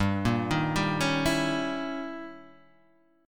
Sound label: Eb 7th Flat 9th, G